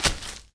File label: Grass step